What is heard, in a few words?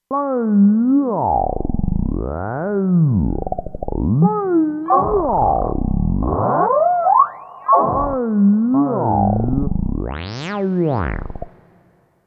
Talk, Kurzweil K2500X; Factory Setups